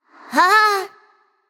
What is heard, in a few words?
nv confused; cupid